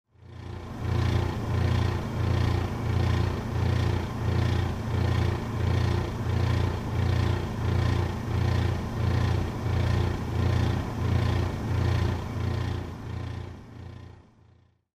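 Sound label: air conditioner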